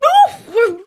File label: voice female critical